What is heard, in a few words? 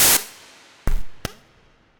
IDM Perc, IDM Percussion; Ableton; Factory Packs; The Forge by Hecq